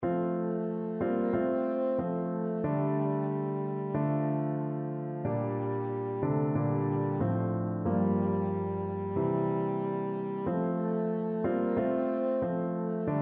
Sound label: french horn